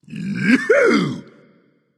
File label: nv yay; Smite; ymir skin14